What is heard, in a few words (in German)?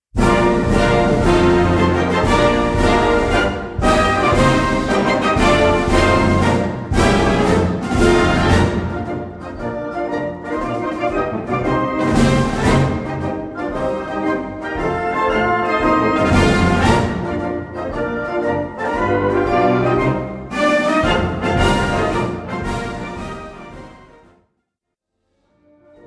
Cyber Marsch; Arbeitsaufnahme